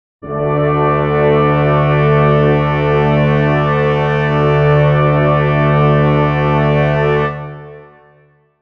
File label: brass chord d major